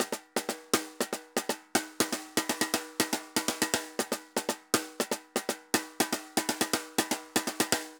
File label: Tambor Bomba 120, Drums & Percussion, 120 bpm; Best Service; Festa Latina VOL-1